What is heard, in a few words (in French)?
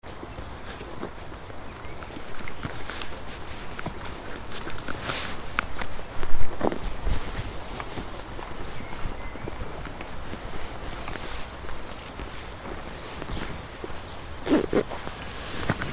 soundscape cimetière